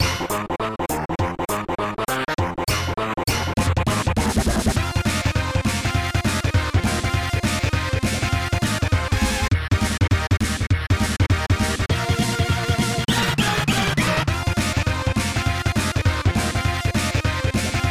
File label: Alleycat Blues; teenage mutant ninja turtles iv turtles in time